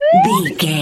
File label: whistle slide up